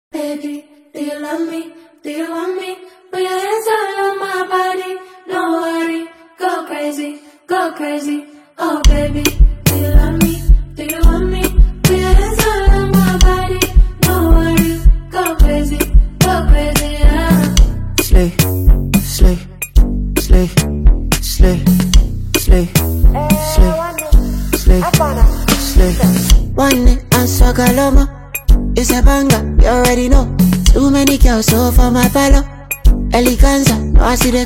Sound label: Amapiano